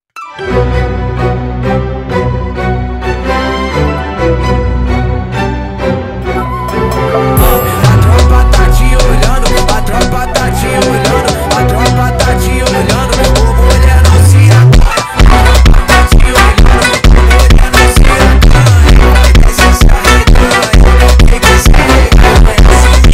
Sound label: funk